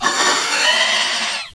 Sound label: screech